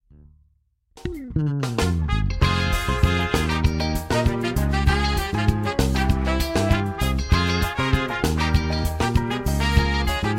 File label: Jazz